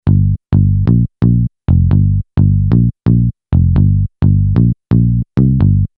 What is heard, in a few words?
bass